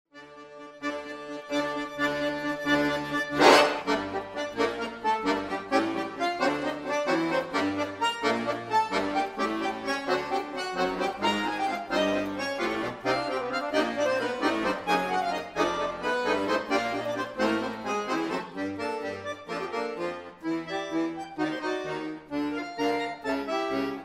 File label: Fuga